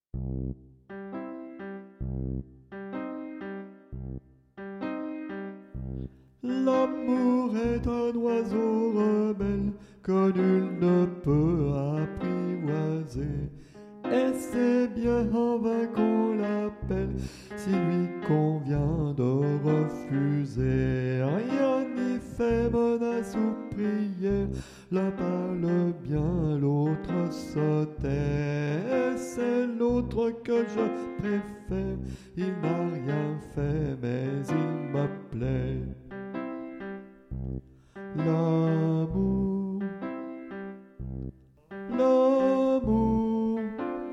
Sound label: habanera sop, chants